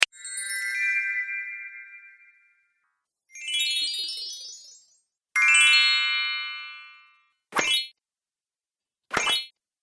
Fairy Sounds Sparkle; Magic Sound Effects; Pack 1

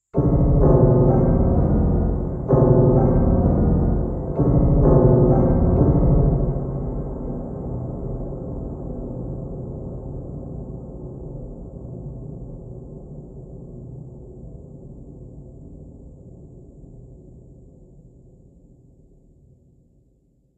Horror Dark Piano, Piano Sound Effects; musical

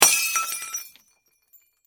Impact Glass Break Wine Glass; Glass Sound Effects; Glass (Sound Effects:Materials); SFX Bible 6

